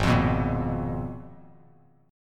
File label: Ab Minor 6th Add 9th, Ab, Bass Standard; strummed; JGuitar